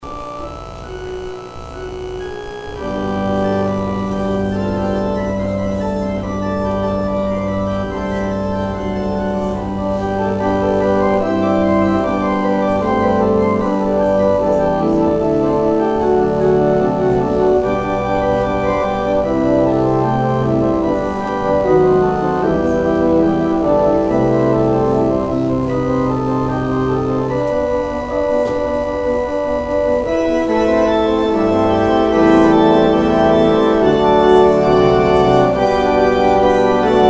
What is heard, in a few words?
organ intro